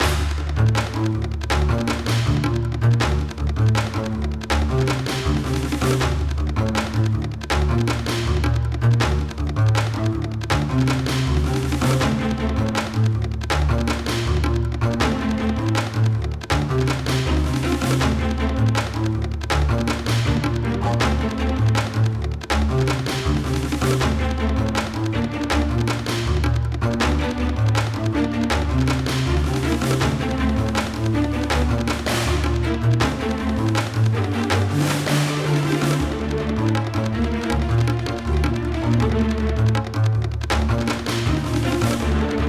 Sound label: Music Tribal Chase LOOP; Knights & Guns